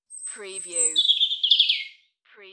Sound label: UK Birds; beanstalk audio